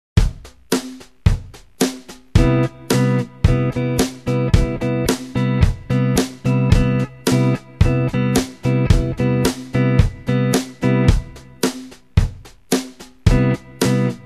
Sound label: Rhythm Guitar